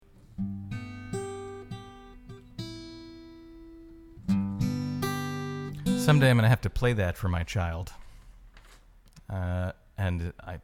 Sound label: Cannot Tune Guitar